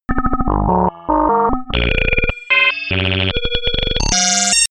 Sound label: virus infected